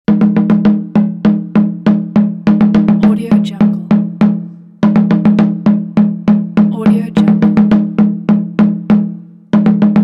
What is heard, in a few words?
native american drum studio